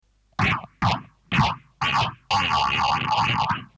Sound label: laugh